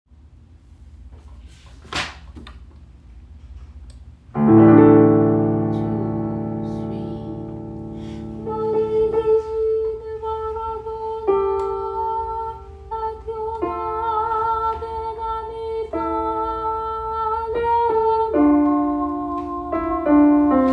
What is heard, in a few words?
Soprano; Chapter 1 mm 7-16; Shirei Chesed Fall 2025 Recordings